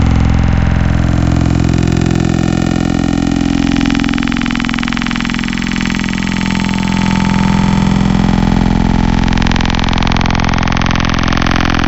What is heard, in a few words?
Dirty Throat; Synthetic's Serum Collection; XLNT-Skrilla Tables